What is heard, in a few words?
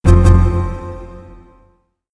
Critical Battery Alarm, Windows Longhorn